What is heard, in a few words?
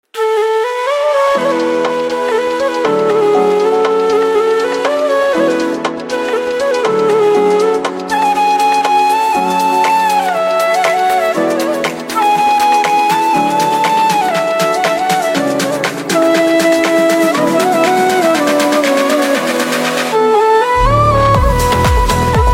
Flute Instrumental Ringtone